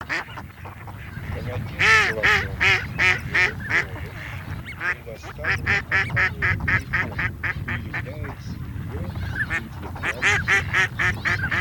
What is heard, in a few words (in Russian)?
Кряква Germano